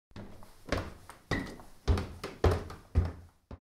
stairs

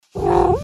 little puff purr

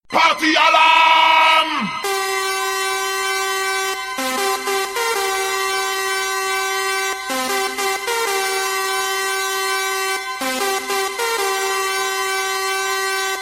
jingle

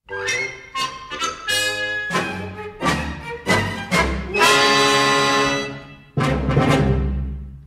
comedy ending; spongebob

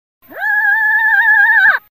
ahh

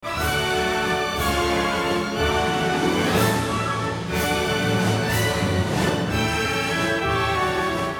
background; lego batman

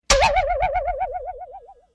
goofy ahh spring sound

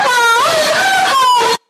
auhg

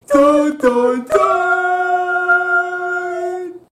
the dun dun dun guys

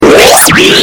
rehehehe but ruined

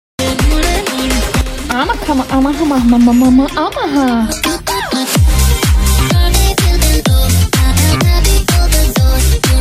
edm

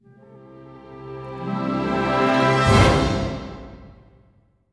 cres trem and hits

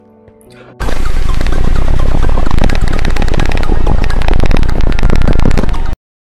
sound barrier

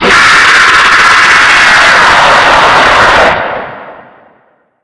screamer; funtime

